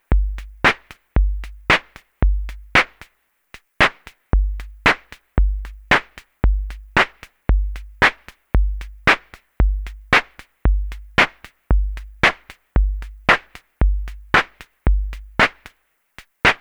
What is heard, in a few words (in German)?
Snare Trap BD2 Regler